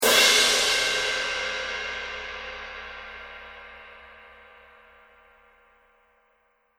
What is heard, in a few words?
cymbal